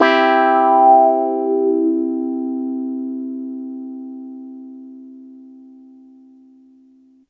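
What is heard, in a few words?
Piano Clav major med; TrashLibrary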